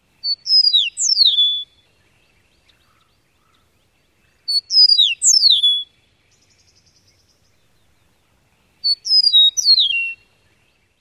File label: song